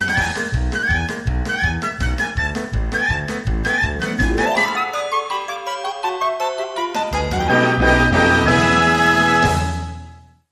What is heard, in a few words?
Sting